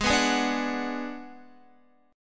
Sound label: G sharp Minor 7th Flat 5th G sharp down Guitar; G#m7b5 strummed; JGuitar